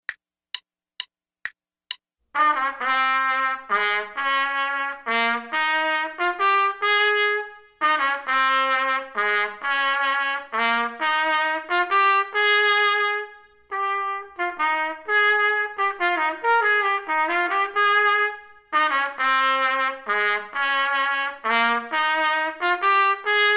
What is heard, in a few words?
trumpet 2; metronome setting for this performance is dotted quarter note = 76; Selected Duets for Trumpet, Page 10 Number 2, Christmas Song, performed by David Summer.; Trumpet 2 Only